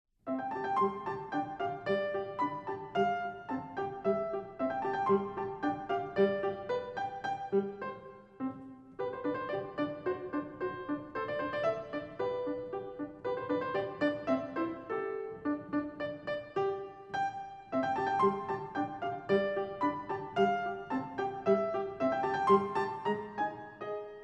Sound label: polka